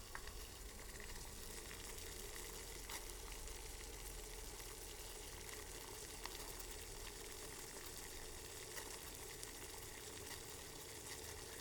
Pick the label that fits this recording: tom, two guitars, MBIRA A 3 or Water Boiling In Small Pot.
Water Boiling In Small Pot